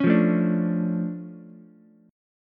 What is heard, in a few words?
D Minor Major 7th, D, Ukulele; 6,5,5,5; DmM7 strummed; JGuitar